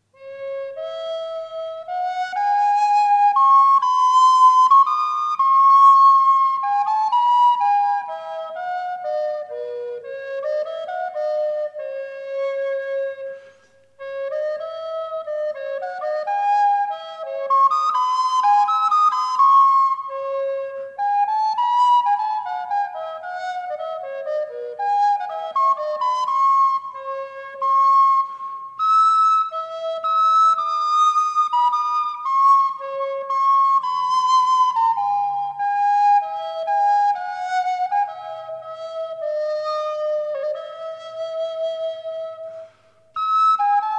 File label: Soprano, recorders; Beukers